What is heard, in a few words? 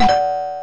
extra life; Gnat Attack; Super Mario Paint